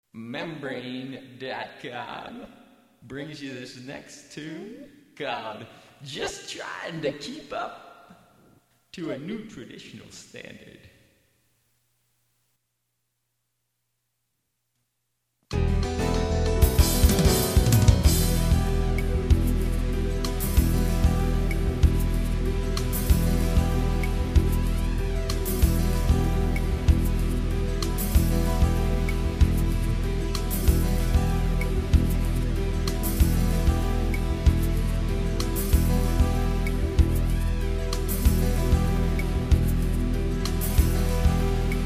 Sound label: 6 string electric